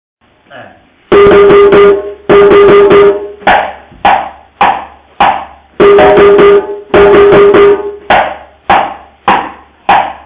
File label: Kadan Break